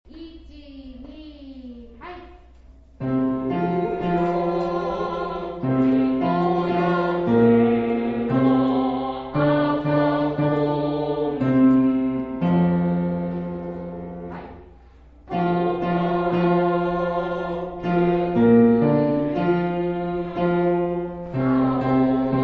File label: bass